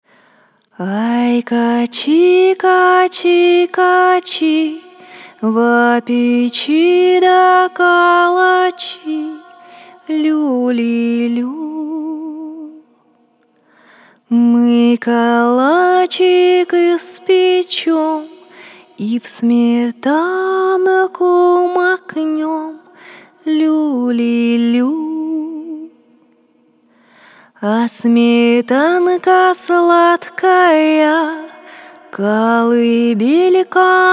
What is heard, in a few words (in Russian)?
Русские народные колыбельные, Ой качи качи качи